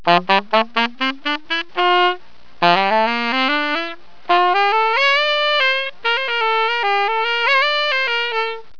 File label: saxophon